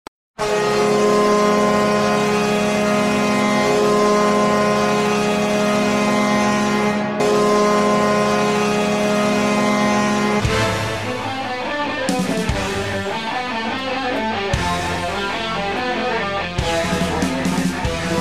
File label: Mercury women's goal horn